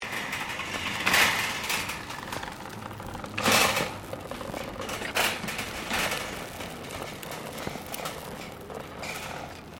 Trolley Shopping Supermarket Rolling, Shopping carts; Stores and Malls (Sound Effects:Backgrounds)